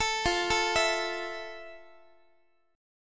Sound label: F Major 7th F, Guitar; arpeggiated